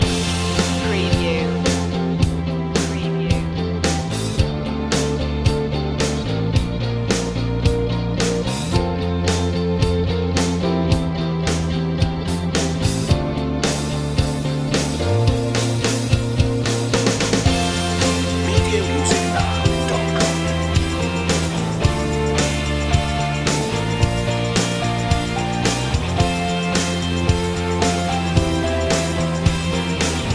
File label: pop presentation loop